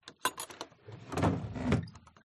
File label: Drawer Heavy Dresser With Metal Handles Close; uberduo